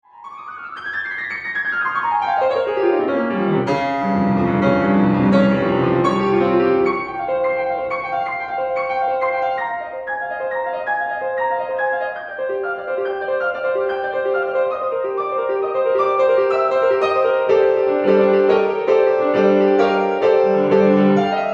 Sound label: Calypso - Vivace; variationssuite